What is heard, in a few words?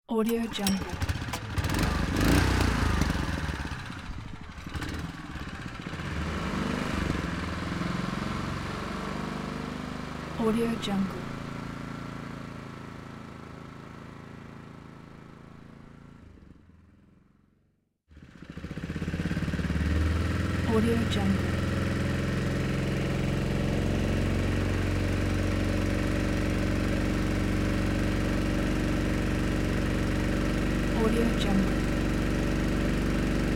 motorboat engine sounds; urban sounds